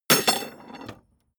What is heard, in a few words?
metal tools drop; soundpool